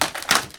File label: Wood break